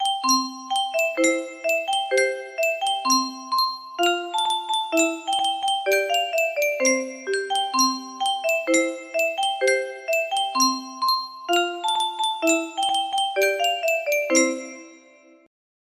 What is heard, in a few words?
Yunsheng music box unknown tune